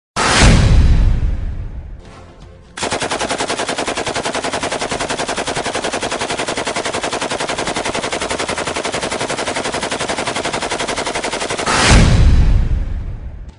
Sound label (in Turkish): Taramali Silah Sesi; Karisik Sesler